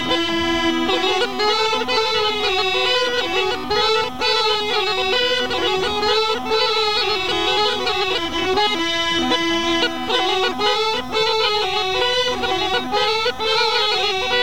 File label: Rodope Gajada, Break